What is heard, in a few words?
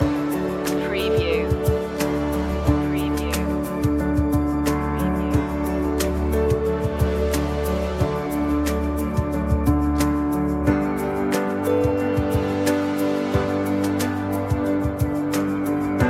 inspiring beauty loop